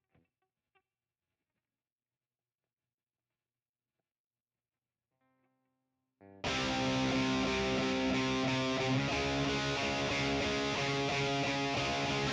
Guitar 2

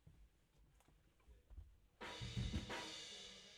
Piano Low

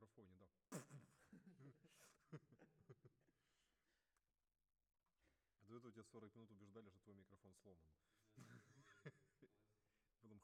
Tenor, RNR for piano

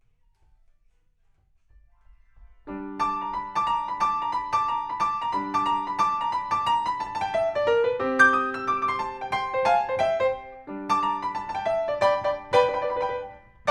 PIANO, RNR for piano

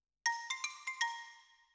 Xylo

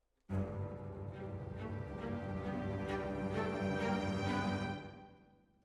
Decca, Jazz for piano

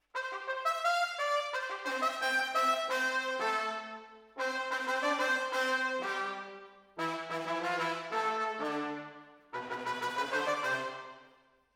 Horns Over

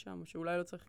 GTR

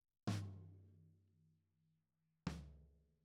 SNR Top

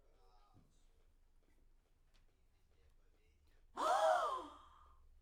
Backs; SCHOEPS

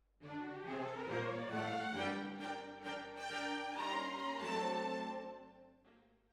Hall

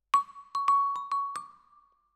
Vibra Close

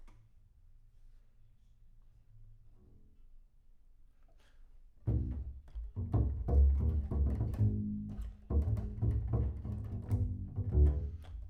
Bass Room

